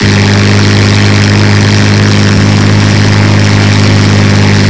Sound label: l6 petrol large, engines; acf engines